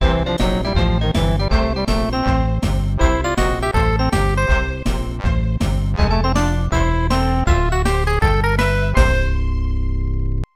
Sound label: Level Clear; Toy Story 2 Buzz Lightyear to the Rescue; N64